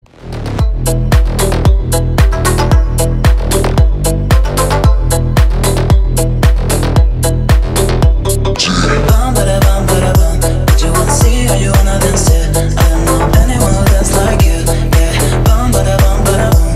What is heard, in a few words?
edm